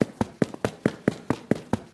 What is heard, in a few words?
dash